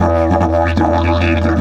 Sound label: 150, DIDGE RIFFS